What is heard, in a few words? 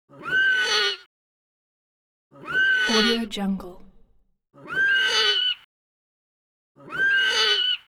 magic horse whinnying various bird calls cooing